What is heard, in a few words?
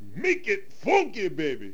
funkybaby